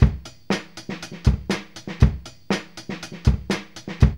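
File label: 120 bpm, Funky loops; Zero-G; Funky Elements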